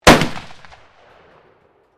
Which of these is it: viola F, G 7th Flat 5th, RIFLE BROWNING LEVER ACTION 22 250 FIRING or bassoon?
RIFLE BROWNING LEVER ACTION 22 250 FIRING